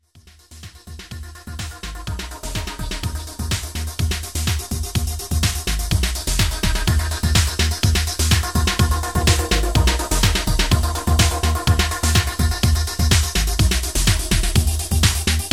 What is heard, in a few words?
Chime